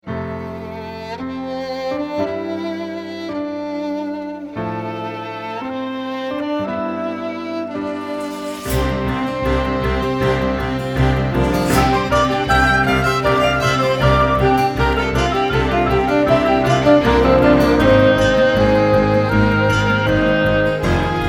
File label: Industrial